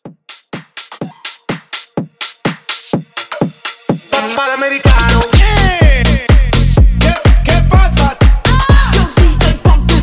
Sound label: Mixshow Hype 125